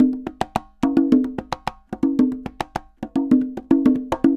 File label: Congas Murga 110 bpm, Drums & Percussion; Best Service; Festa Latina VOL-1